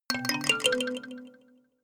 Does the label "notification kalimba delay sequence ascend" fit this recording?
Yes